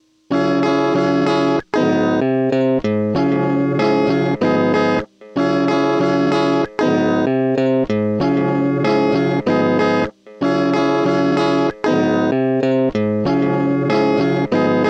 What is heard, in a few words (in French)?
Enchaine Accords Entraine Juste Cordes